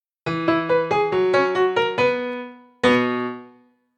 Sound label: School Piano Jingle